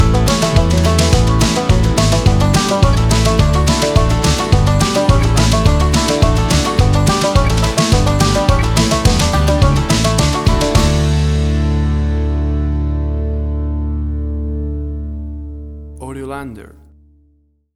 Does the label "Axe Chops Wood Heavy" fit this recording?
No